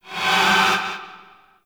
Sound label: Void Charge; Duviri; Incarnon Adapters; Weapons